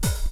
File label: DR. LOOP; X-Static Goldmine 3